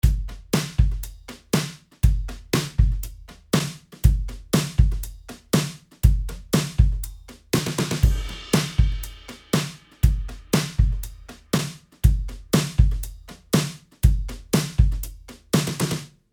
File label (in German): Logic ProX Blue Ridge Drums TubeEQ Aus An Aus An